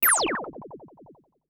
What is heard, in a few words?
SPACE FX 140; SpaceSounds